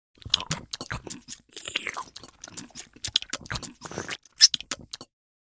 Ravenous eating noise slurping and chewing

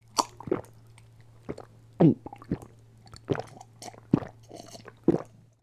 Drinking water with throat swallow

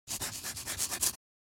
Thick chalk stick scribble on blackboard

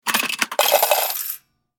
Vending machine coins dropping into return; money

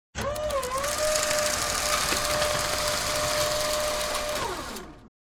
Electric roller shutter close perspective; blinds

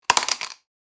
Headphones falling on surface soft impact; headphones and speakers

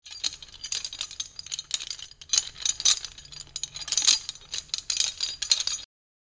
Boat anchoring metal chains movement clinking

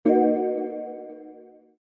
New level or feature unlocked

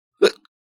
Adult man hiccup single

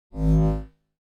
Lightsaber sword swinging

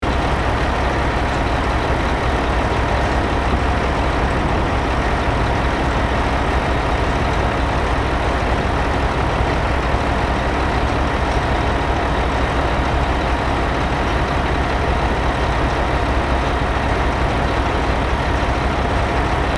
Truck crane engine idle loop

